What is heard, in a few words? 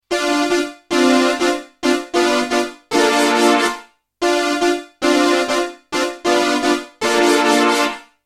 caged synth